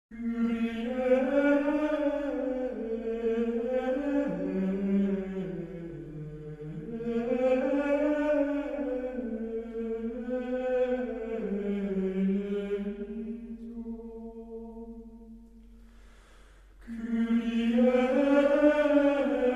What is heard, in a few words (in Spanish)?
canto gregoriano kyrie; partitura